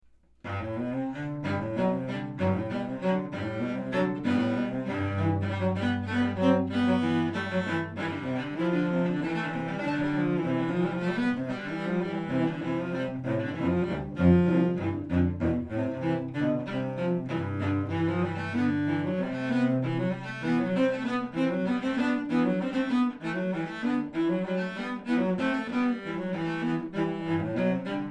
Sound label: Etude for 2 Cellos